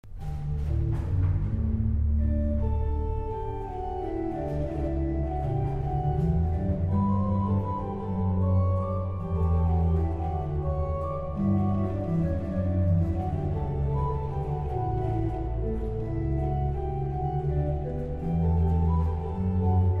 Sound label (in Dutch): orgel